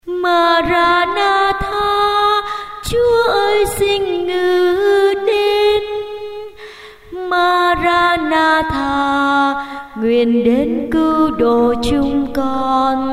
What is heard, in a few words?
alto